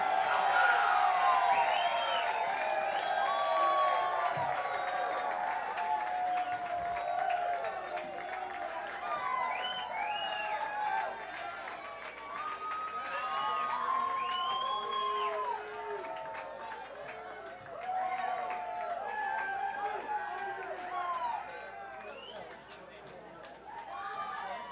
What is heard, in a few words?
Crowd; The Bluebird Nightclub Bloomington IN USA